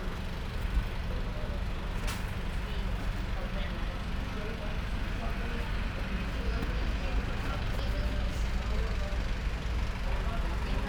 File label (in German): Istanbul Flughafen (Im Bus); Boudha, Kathmandu, Nepal